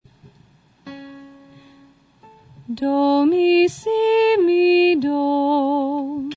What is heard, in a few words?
augmented triad